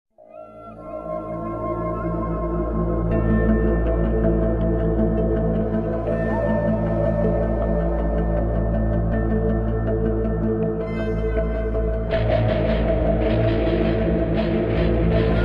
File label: slowed reverb; Shadow Fight 2; Lynx Theme